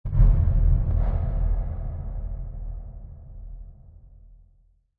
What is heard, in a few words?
Heavy Hit Reverb, Distant heavy impacts, hit, knock, fall; Heavy hit reverb. Horror - Distant heavy impacts, hit, knock, fall, thud, with heavy reverb.; Punch And Hit Sound Effects; Lynne Music